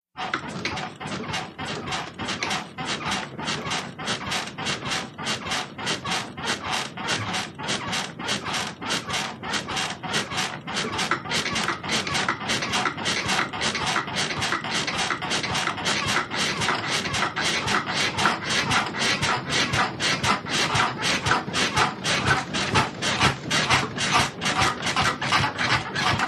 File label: Bed Creaky Old Wooden